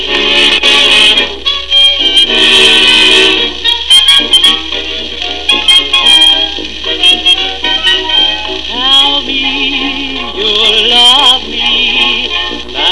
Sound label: wrong stylus